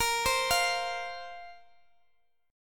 Bb Suspended 2nd Bb down Guitar Open G

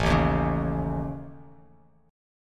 A Major Flat 5th, Bass Standard; AMb5; strummed; JGuitar

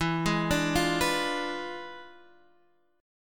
E 7th Flat 9th E down Guitar Open D

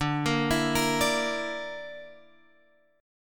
D Suspended 2nd Sharp 5th D, Guitar, Drop D

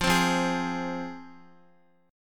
F Minor, down, Guitar; strummed; JGuitar

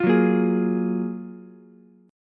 F sharp Major 9th, Ukulele, C6; F#M9 strummed

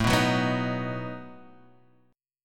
B Major A

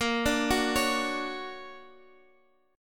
D Augmented Major 7th A sharp, guitar

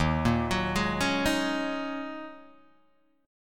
D sharp Minor 11th D sharp Guitar Open G, chord; 1,3,4,1,2,1; chordshape

